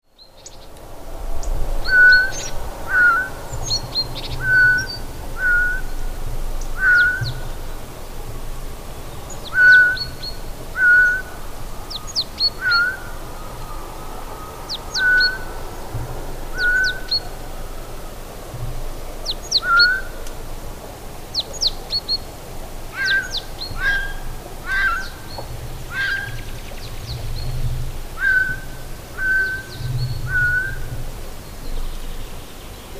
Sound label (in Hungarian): Szajkó; Madardalok